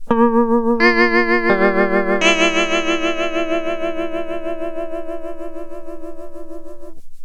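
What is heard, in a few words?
clean guitar devtronix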